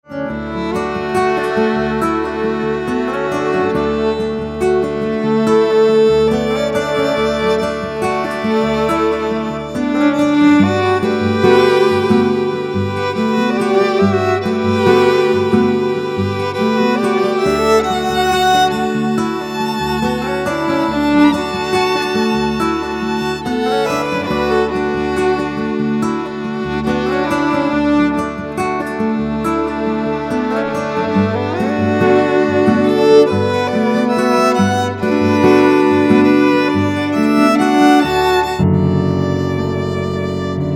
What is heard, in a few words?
Violin Guitar Piano